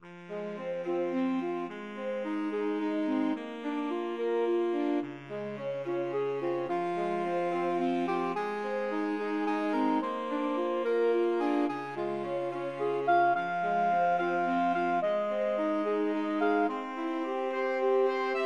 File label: house of the rising sun SAX quart